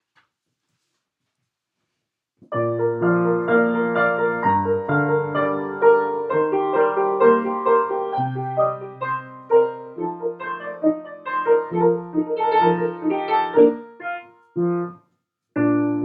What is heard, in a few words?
finale - instrumental